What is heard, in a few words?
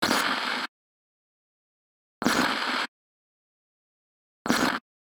TV Turning On Off Or Switching TV Channels Close